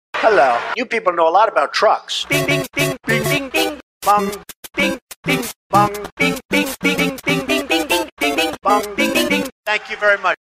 funny video game background music tone ringtone

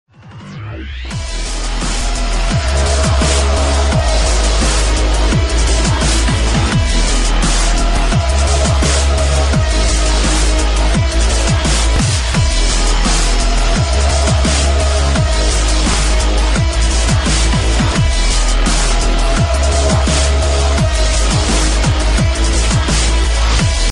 dubstep ringtone

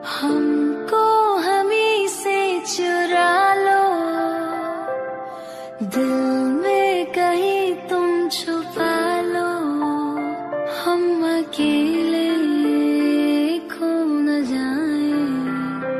song love ringtone, bollywood ringtones

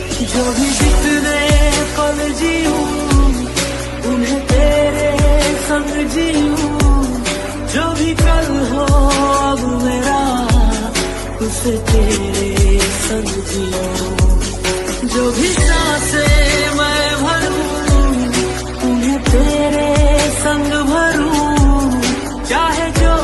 romantic ringtone; bollywood ringtones

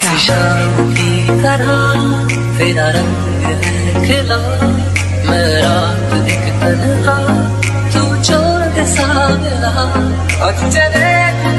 dj mix ringtone, bollywood ringtones

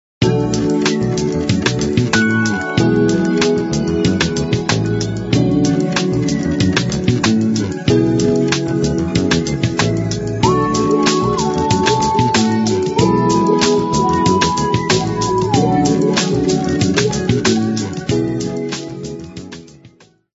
nokia sooth ringtone

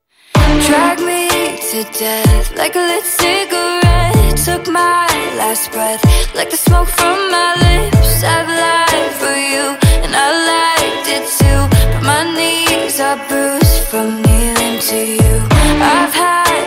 english ringtones

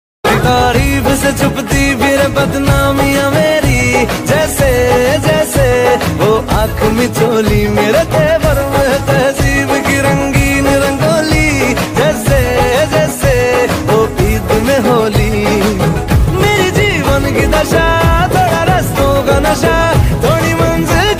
music bollywood ringtone

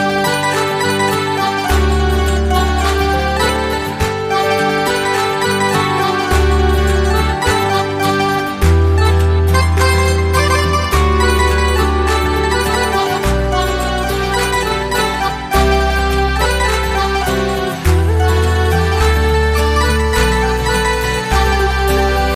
love song instrumental; oru adaar love

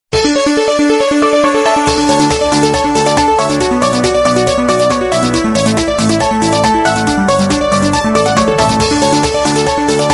old classical tone

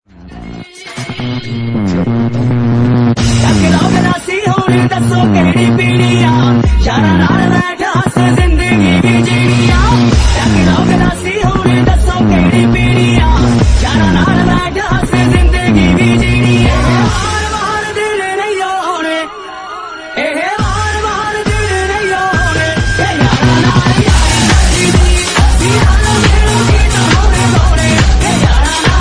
bhangra ringtone

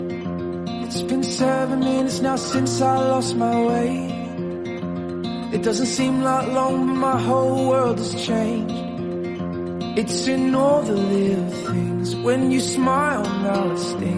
romantic english song tone ringtone

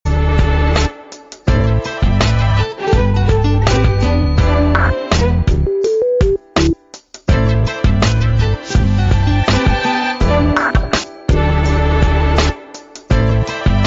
nokia lumia 920 mix ringtone

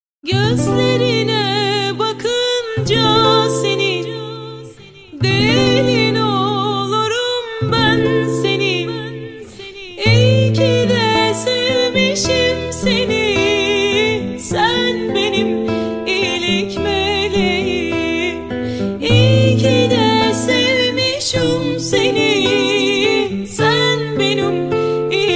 turkish romance ringtone

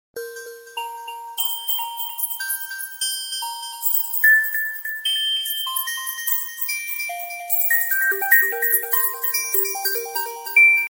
i phone 5 ringtone

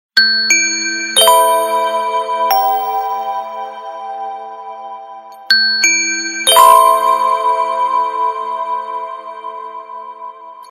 sms ringtone; oppo pad

